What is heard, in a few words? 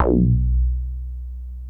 MINI, MOOG BASS